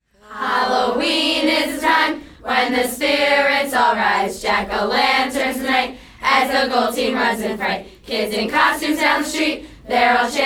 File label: cheers